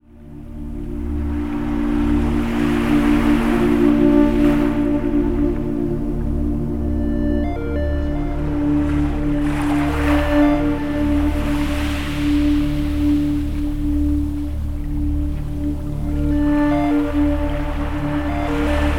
Ocean Music

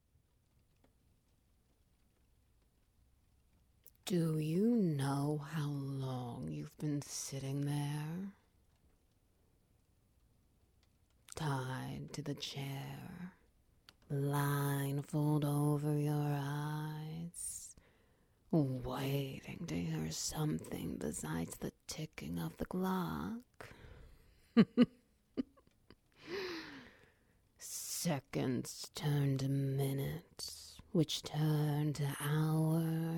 Ambience, Heartbeat, Petting

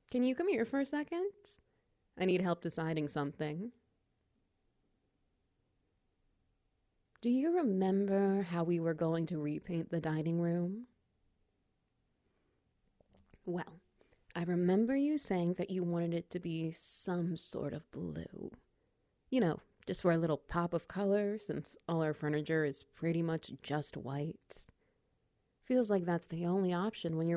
Wet Noises; Too Proud to Back Down

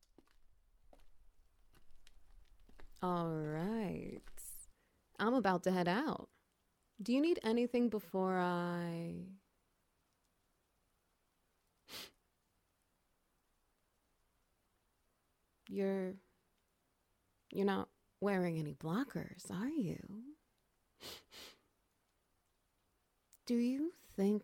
Ambience, Wet Noises

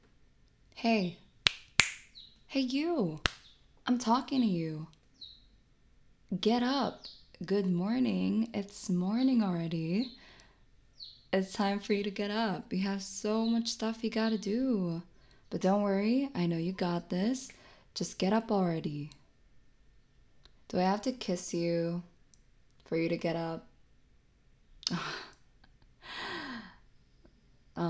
alarm

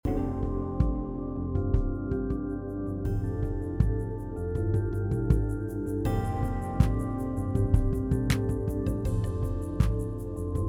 bar music

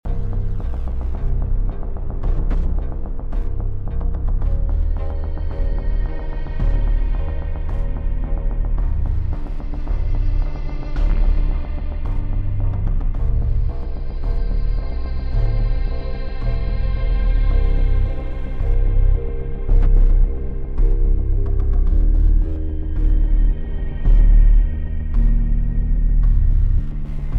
suspense loop night

